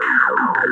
rez beat; Shogo; Akuma

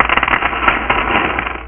Pool; Realmz

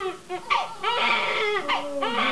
cry; neonate

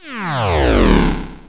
APPEAR

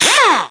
click acc

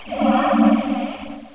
AMB; Abuse

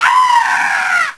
SCREAM; Death from Above

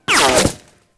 w hit; Team Alligator

